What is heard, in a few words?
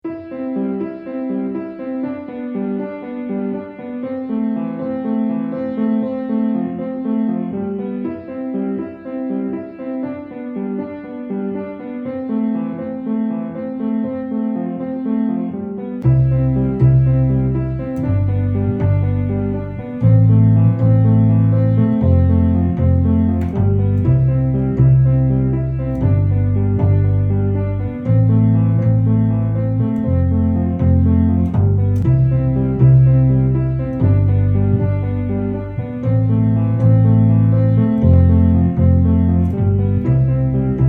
Collective Track no guitar